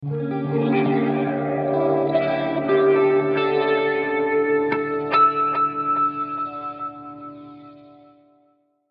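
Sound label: Musical Element, Drum Snare March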